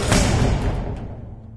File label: elev, ambient tones; Source Engine; cstrike